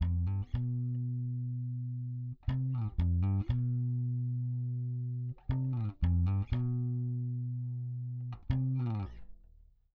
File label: bass